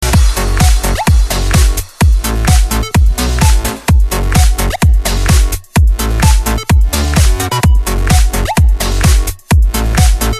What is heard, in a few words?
disco